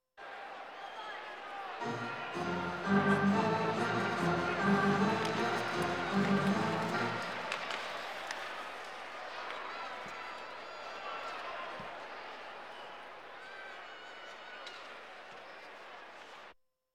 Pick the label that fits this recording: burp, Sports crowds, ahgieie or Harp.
Sports crowds